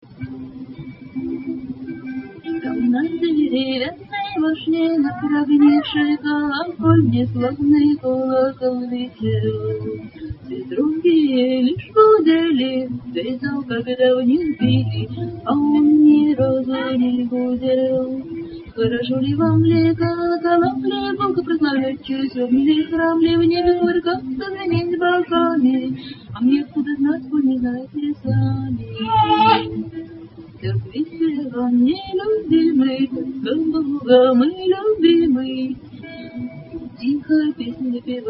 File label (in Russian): Колыбельная о старом колоколе